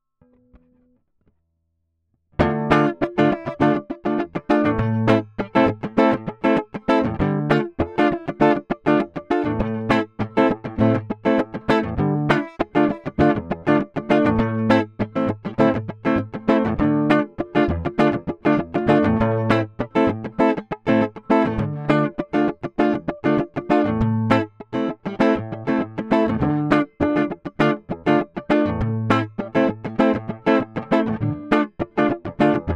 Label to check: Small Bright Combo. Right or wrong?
Right